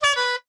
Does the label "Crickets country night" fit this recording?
No